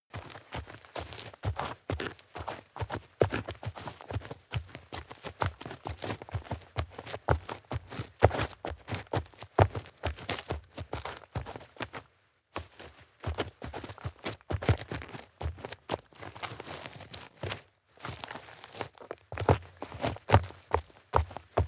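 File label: step dirt heavy boots walk fast, footsteps; schritte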